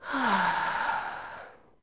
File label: Breathe Out; Kotake